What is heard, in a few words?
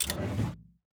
menu close window; Hearts of Iron IV Death or Dishonor